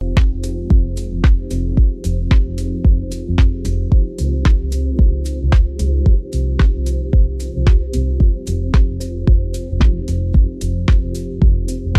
moonlight groove

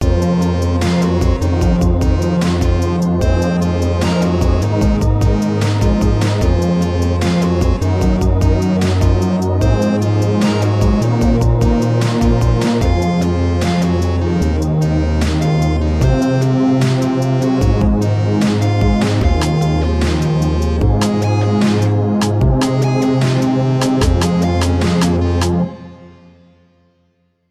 phonk

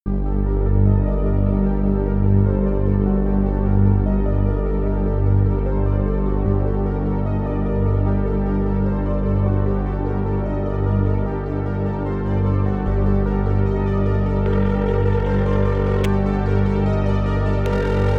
waves phonk melodic

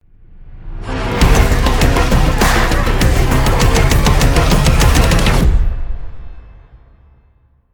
epic dubstep tech intro logo short version

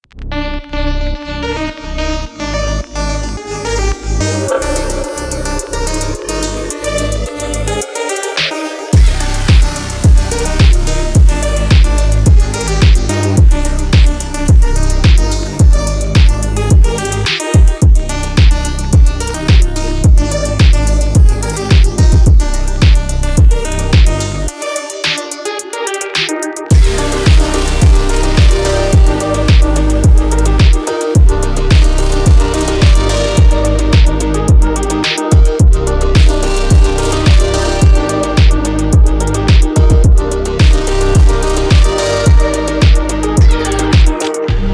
midnight phonk instrumental

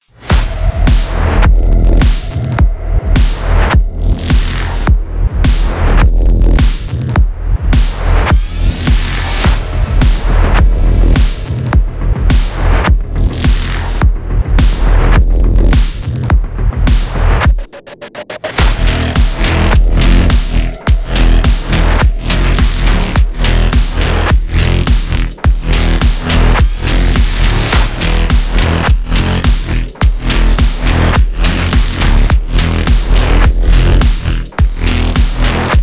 dark cinermatic electro trailer dark engine

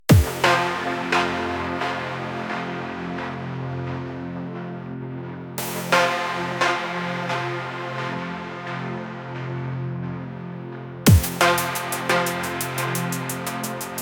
bass monster bass

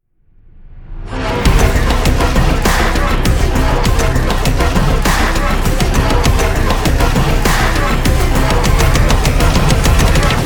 epic dubstep tech intro logo main version

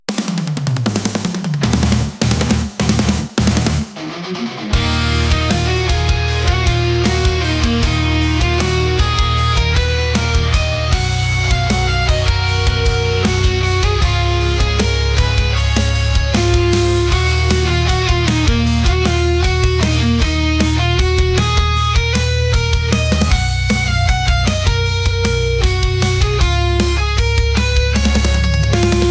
bass boom bass

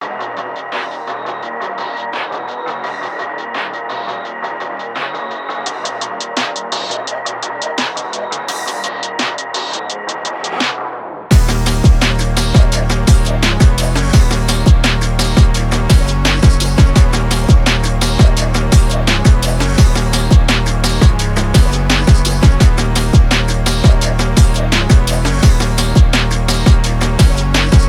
hard drift phonk

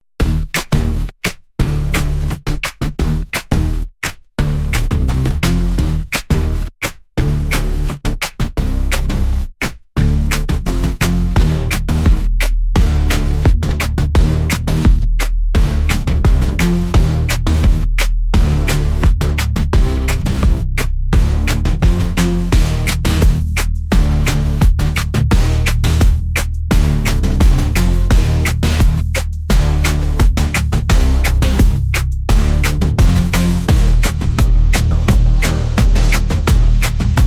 city beat

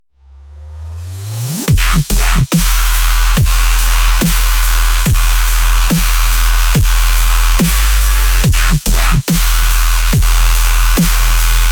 wobble madness bass

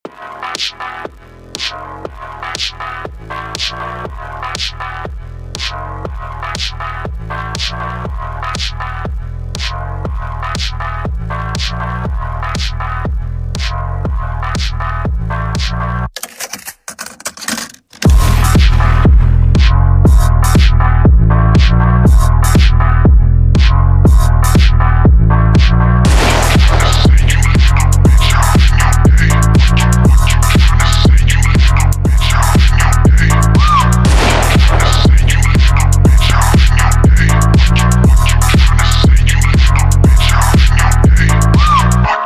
phonk energy

